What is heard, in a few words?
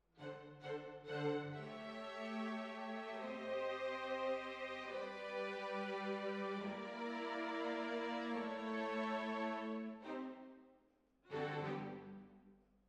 Hall, Jazz for piano